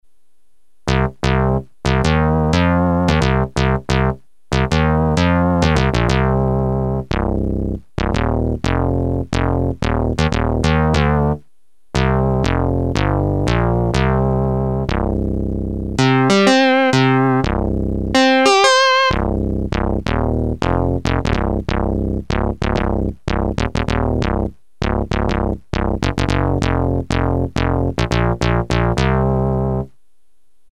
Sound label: SYNTH BASS, Casio CZ-1000; Preset